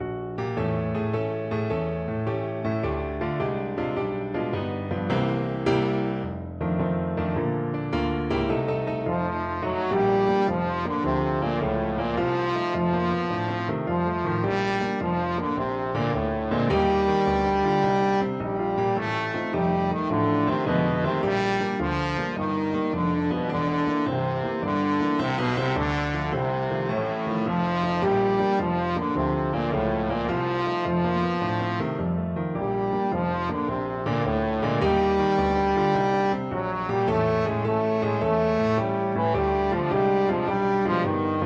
beside seaside, trombone

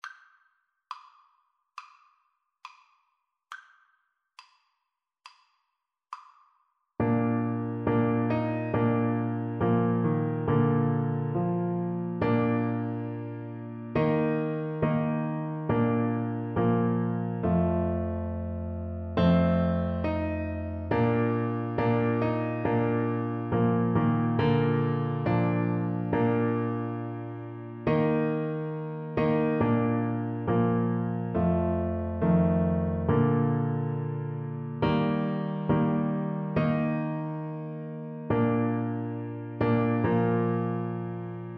Trombone

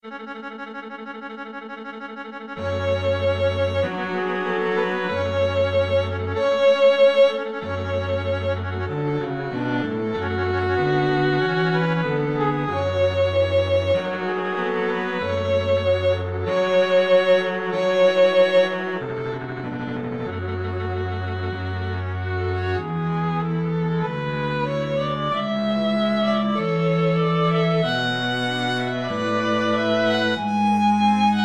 scene finale, string trio

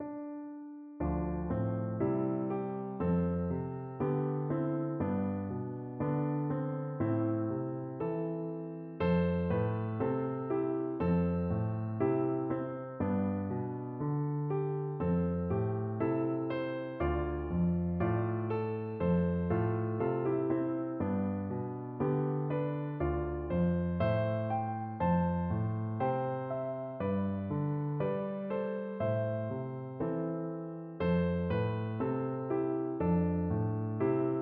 wondrous, piano

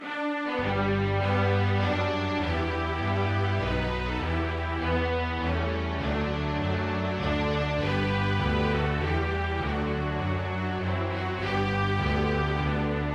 star spangled banner, string ensemble

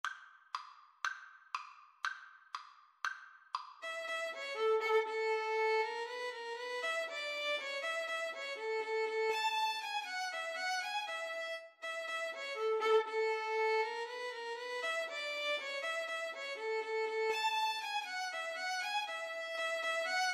VLN VLA duet, Violin Viola Duet

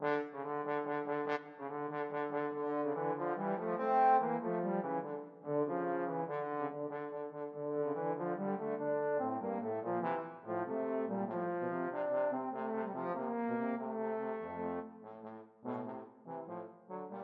Serenade trombone duet

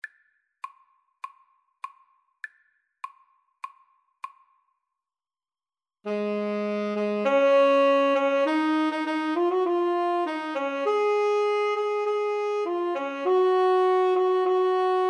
Overture, Tenor Sax Duet

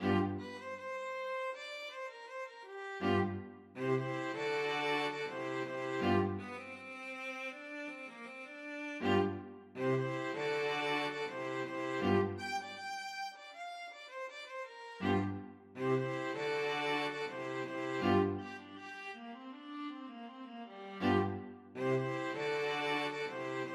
STRQ; string quartet

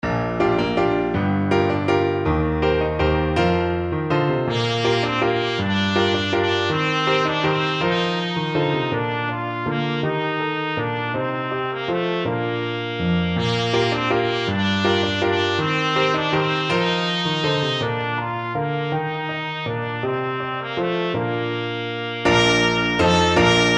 trumpet

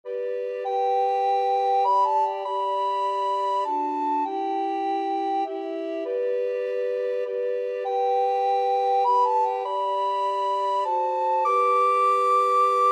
amazing grace, recorder trio

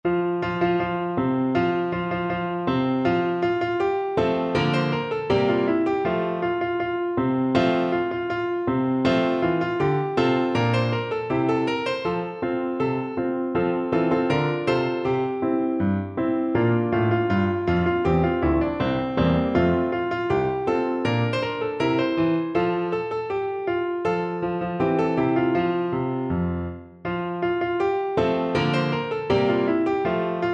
non komo muestro, piano

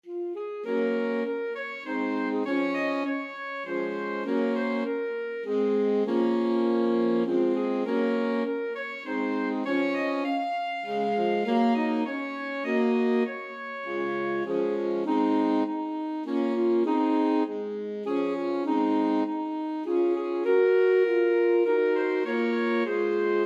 holst jupiter, alto sax quartet

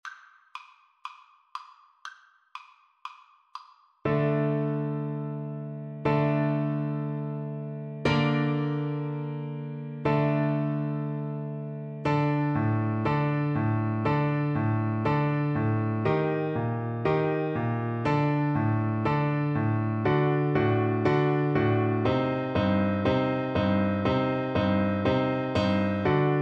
hava nagila, Tuba

